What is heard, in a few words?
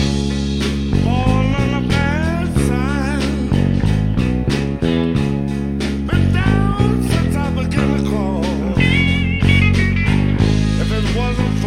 bass lines loop of song